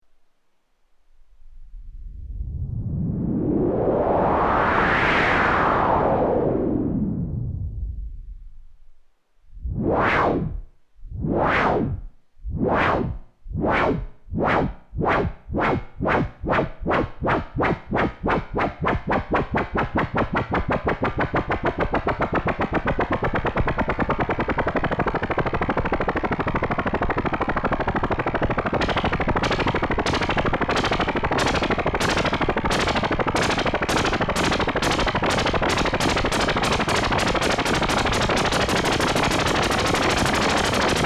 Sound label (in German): Rauschen mit beiden Modulationen